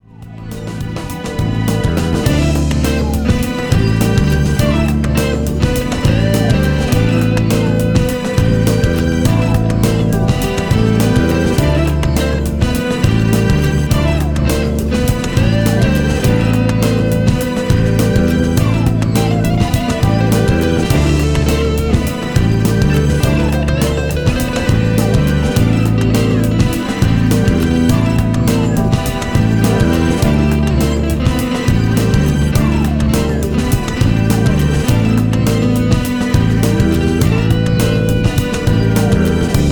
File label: Guitar